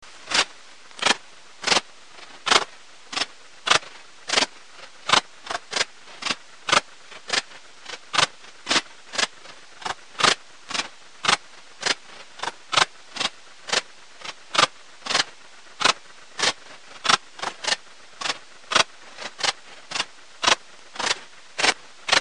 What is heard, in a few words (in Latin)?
pholidoptera griseoaptera; wirbellos; insekt; heuschrecken